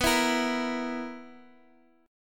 B Major 7th Suspended 4th B down Guitar Half Step Down